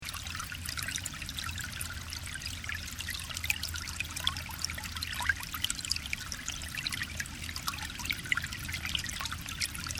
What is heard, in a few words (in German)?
Wasser